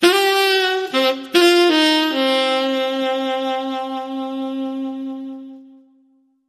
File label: Steamy Sax